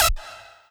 lead

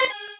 normal hit normal

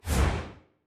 screen wipe out

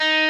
Arp.

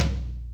normal hitfinish

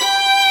violin; osu

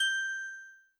Key

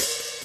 open hihat; osu

